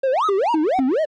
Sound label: Whoops; Zelda; MM